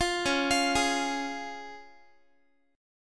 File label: Db Major Db Guitar; arpeggiated; tuning=4A,4E,5A,5D,5G#,6C#; 20,21,20,18,x,x